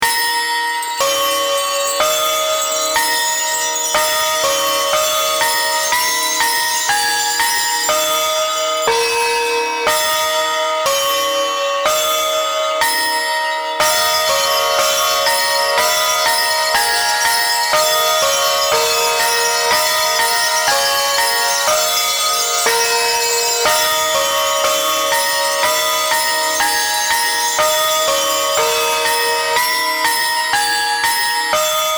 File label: clipped gongs